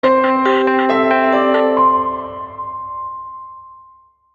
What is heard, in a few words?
BELL